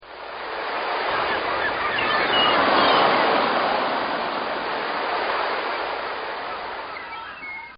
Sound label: Ocean Scene; Ocean And Sea; Nature; Partners In Rhyme